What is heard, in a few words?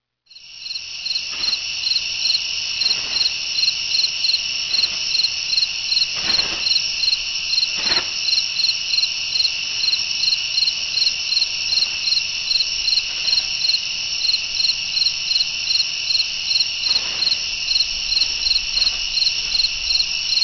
crickets